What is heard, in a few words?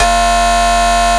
Bass sustain